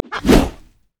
explosive cask; LoL; gragas

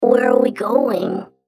attack; BadRobotAmumu

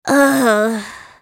Sad Mummy dying; Character Voices; English

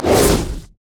sonic wave; LoL; leesin; blindmonk

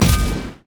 light strike; LoL; lux

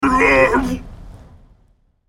dying; ZombieBrand

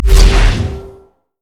summonerspell base barrier; LoL

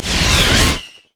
carpet bomb; rumble skin03

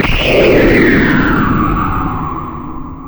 yasuo base quickdraw wind; LoL

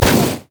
dragon basic attack; LoL; monsters

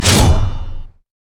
martial cadence attack; LoL; jarvaniv

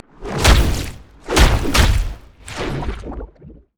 emote laugh; Zac

